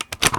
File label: mag out